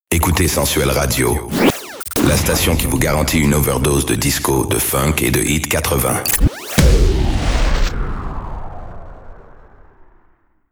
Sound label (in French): jingle hit et funk; jingle radio années 80; jingle radio gratuit